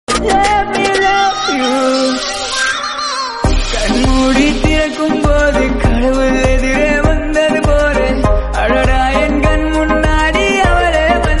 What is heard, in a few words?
love song tone ringtone